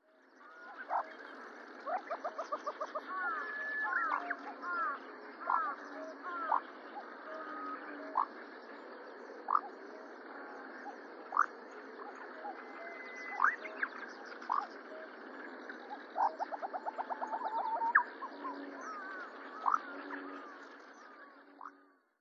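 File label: Green Pheasant; koe; kizi; watarase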